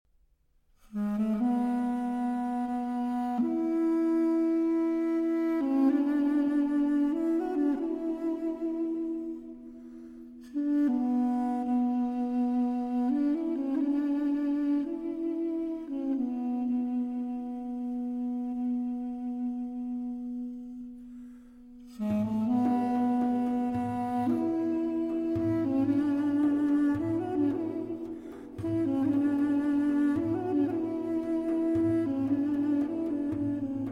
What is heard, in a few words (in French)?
Chant et Danse (2 Duduk et Percussion)